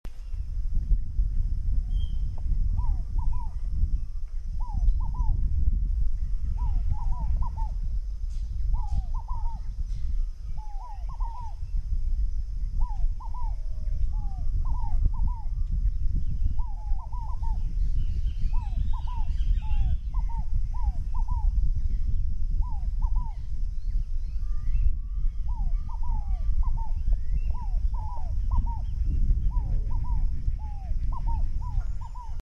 Scardafella squamata

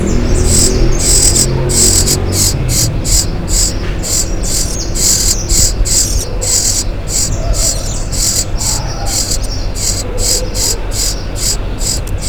Troglodytes aedon begand alarm

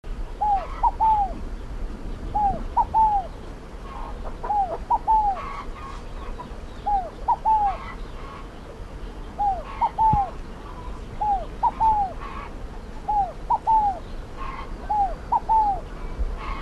Scardafella squammata

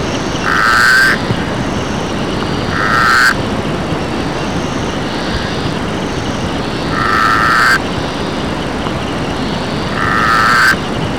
Phyllomedusa azurea

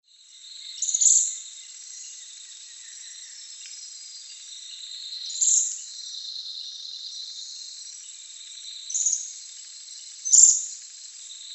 Philydor lichtensteini

